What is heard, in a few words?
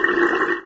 toxic slime gurgle; ambient; canals; source engine; hl2; video games